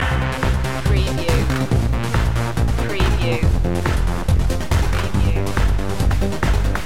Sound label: techno house promo loop